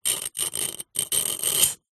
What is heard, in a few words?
foley metal pole scratch sharp object